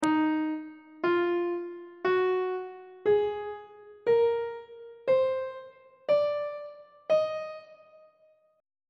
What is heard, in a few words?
d sharp melodic minor scale reverse descending on treble clef